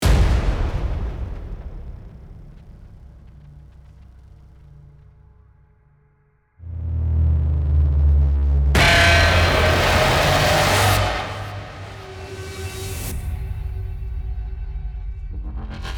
Jet Grinder

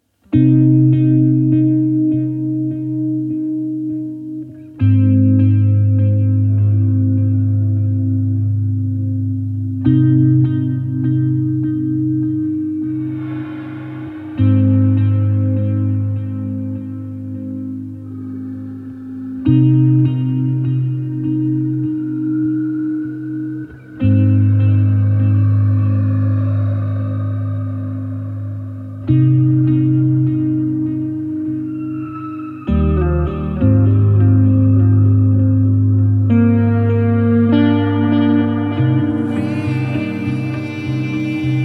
Ambient

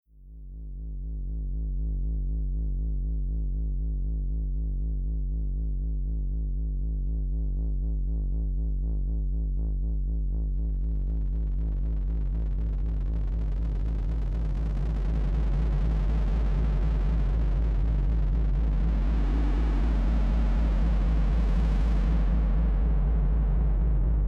Low Soundscapes

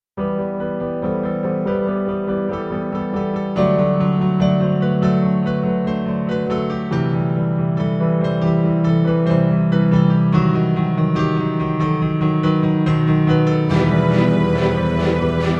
piano song

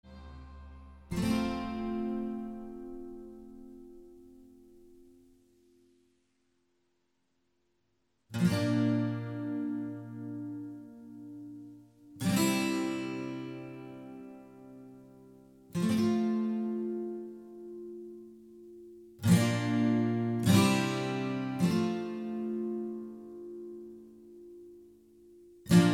Fanfare

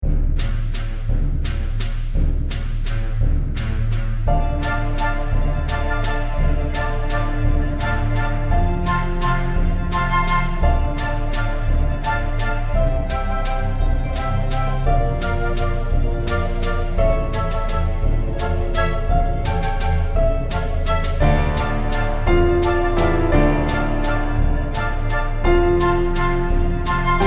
Waltz

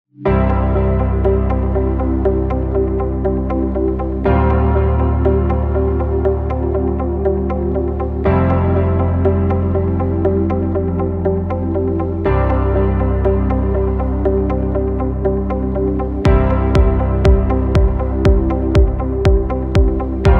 Corporate

